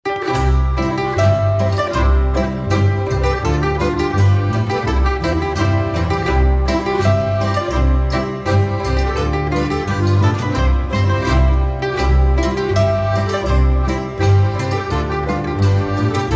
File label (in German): boarischer; Klangperlen